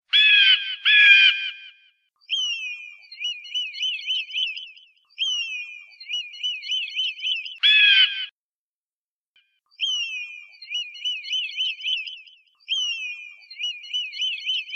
Sonido del Águila, sonidos cortos de animales

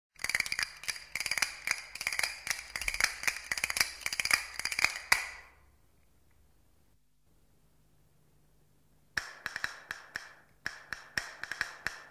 Castañuelas

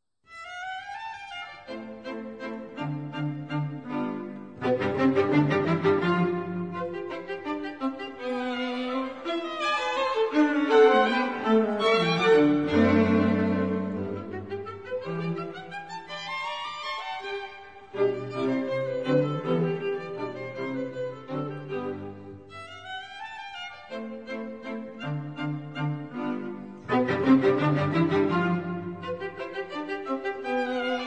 cuarteto cuerdas

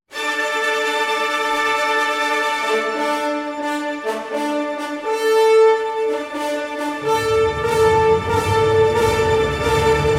Orquesta sinfonica

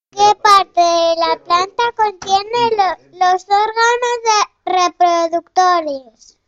ORGANOS

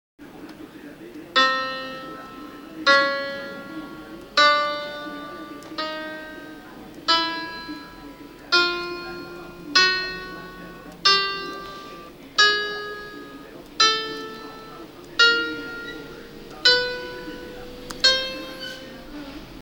Escala Musical

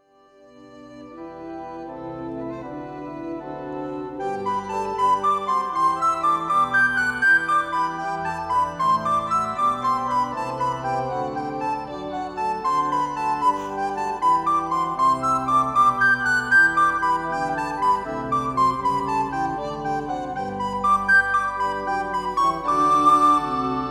flauta dulce

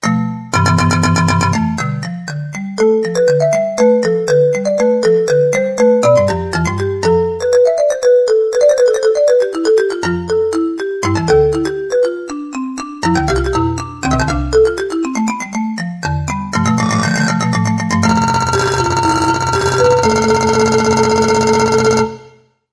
xilofono

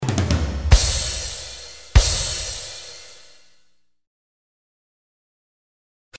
platillos; efectos especiales

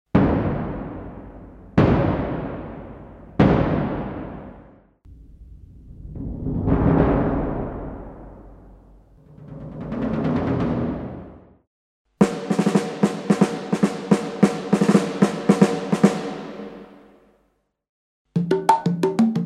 bombo, caja y congas

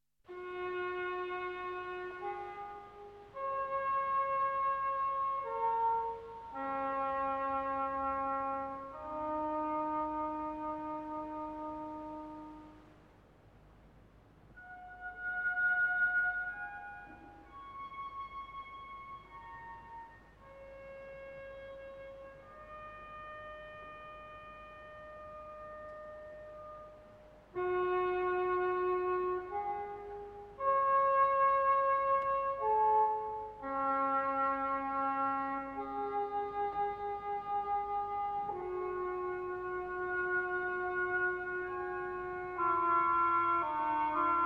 corno ingles oboe

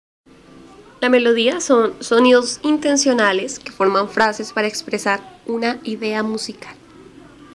Melodía

